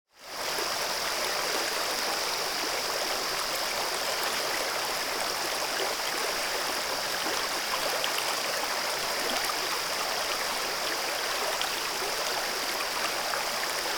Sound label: river stream, 小川のせせらぎ